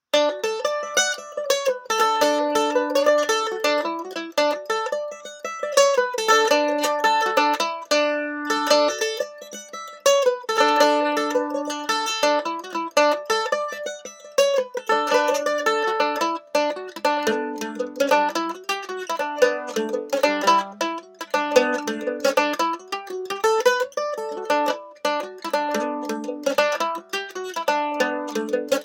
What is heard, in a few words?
Mandolin Pick Comparison